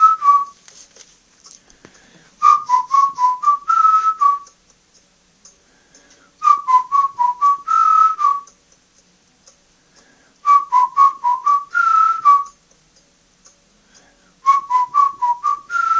120 whistling this tune; REMIXABLE COLLECTIONS; Folksy Guitar and Voice Stems

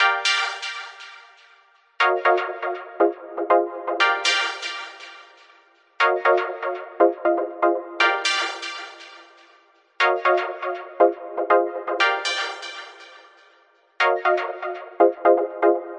120 euphoric synth echoes; SYNTH AND ELECTRONIC LOOPS

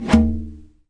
arabic perc, Arabic Percussion Kit; Arabic Percussion Kit 3

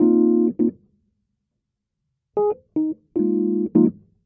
152 mellow jazzy rhodes; KEYS ORGAN PIANO LOOPS

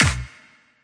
clap, drums single hits; Claps

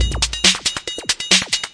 138 bpm krafty break

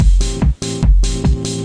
145 fast fun mushy house; 141 to 160 bpm

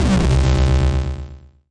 nasty stormfront; Electro FX; UNUSUAL KITS and FX

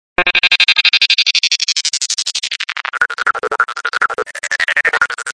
stutter copter synth; REMIXABLE COLLECTIONS; Kraddy Collection

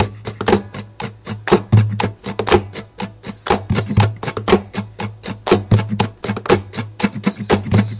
angel percussion, folksy; REMIXABLE COLLECTIONS; Folksy Guitar and Voice Stems

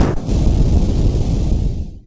death, VIDEO GAMES

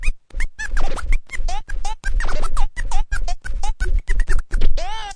turntable, Scratching and Turntablism

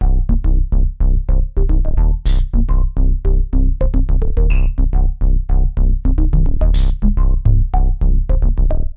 107 jp8080 bass; SYNTH AND ELECTRONIC LOOPS

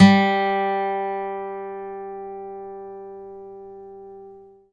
6 String Steel Guitar G2; six string steel guitar

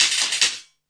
146 bpm noisy rattler, drum loops and breaks; 141 to 160 bpm

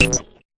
fx drum, Electro FX

